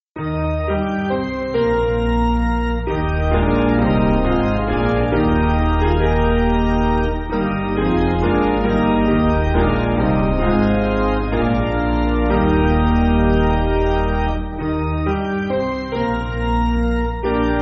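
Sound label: Piano, Music Backing Files for Churches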